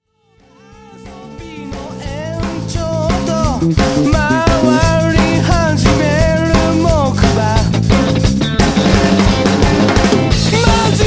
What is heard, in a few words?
rhythm to beat